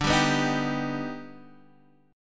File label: Eb Augmented Major 7th D